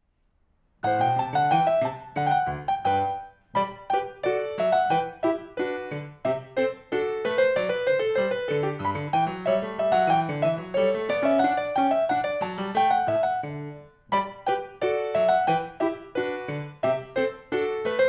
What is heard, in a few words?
Allegro with Batterie